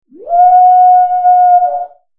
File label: howl real; Halloween